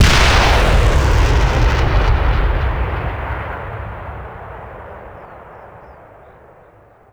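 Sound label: axis bazooka soldier; WAR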